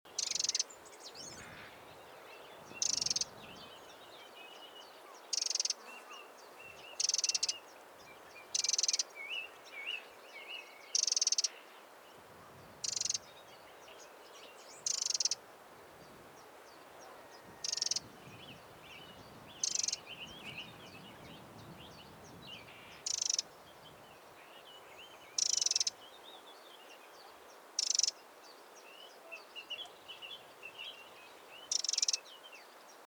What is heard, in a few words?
Troglodytes troglodytes; SETE